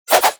Daggers Attack Underlay

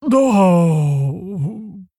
Injured Hauler Tauren Emote Death

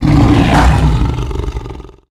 Enter Play

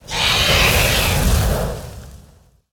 Fire Hawk Play

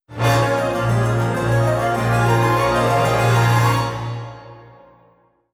Play Stinger; Gilneas

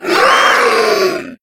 Beast Male Play

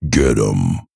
Male Ogre Attack

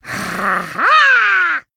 Murloc Emote Attack

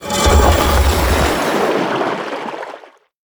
Submerged Spacerock Play

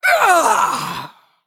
Female Undead Death

